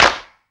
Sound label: CLAP